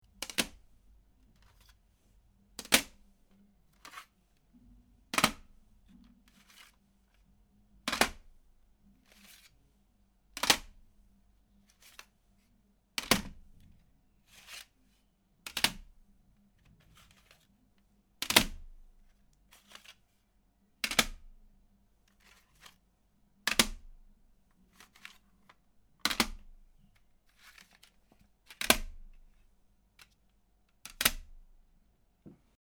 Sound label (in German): cds stapeln